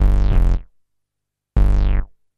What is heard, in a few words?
Hip Hop Acid Bass